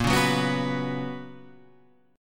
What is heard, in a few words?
B Major 7th Suspended 2nd, chord